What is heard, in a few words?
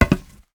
metal footstep